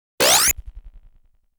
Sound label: explosion sound; genshitou prehistoric isle